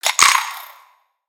Spider Get Hit

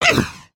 Run, creatures organic

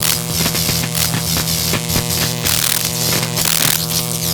electric spark loop; ambience

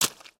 Foot Swamp Water Run; footsteps

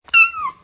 Kitten Meow; creatures organic

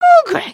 excited, emotes

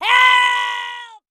bounce scream

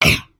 VO Walk Run; creatures organic

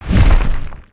Dragon Wing Flap; creatures organic

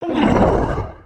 idle, creatures organic

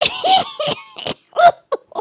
laugh; emotes

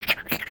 Trex Running Growls; creatures organic

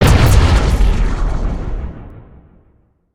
Spider Boss Ground Slam; creatures mechanical

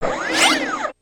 leg servo, creatures mechanical

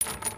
chain; creatures organic

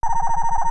Broom Bot Sound, creatures mechanical

